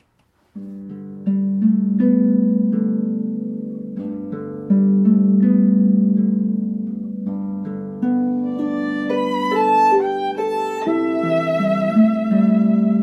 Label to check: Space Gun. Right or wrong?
Wrong